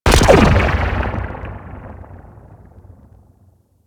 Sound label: Underwater Mine Explosion; Warframe; Grineer Ocean